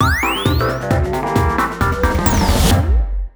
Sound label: Opening Screen Jingle; Jetpack Heroes; LeapFrog Didj